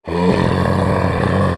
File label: zombie, infected; gesource